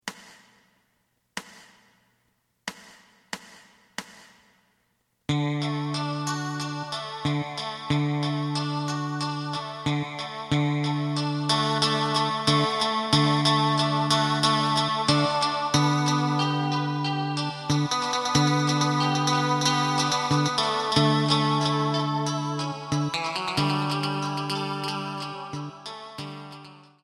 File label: drum